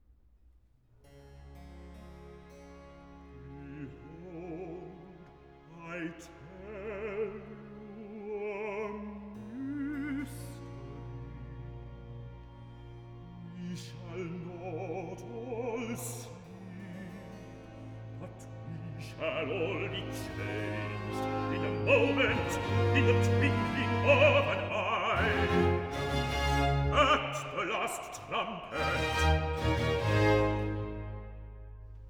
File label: Recitative; Behold, I tell you a Mystery; Handel; Messiah